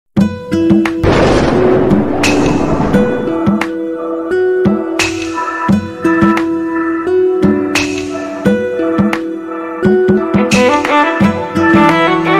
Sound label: bgm ringtone, phone ringtone